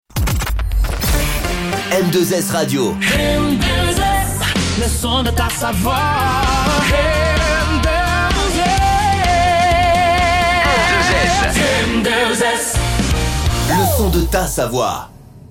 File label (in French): Jingle Chante; M2S